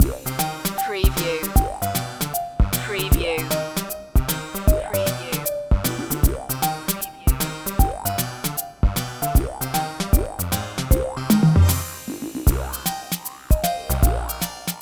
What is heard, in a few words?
retro video game pipe world loop